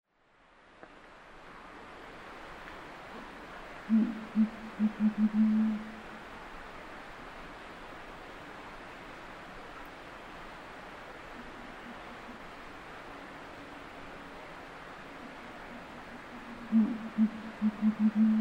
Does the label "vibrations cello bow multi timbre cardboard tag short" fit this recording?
No